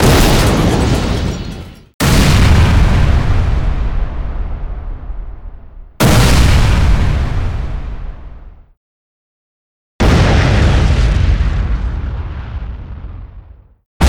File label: Explosion